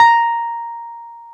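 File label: piano, Wurly; Roland LCDP10 Keys of the 60s and 70s 2; Wurly 200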